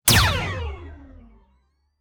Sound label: laser pistol slight charg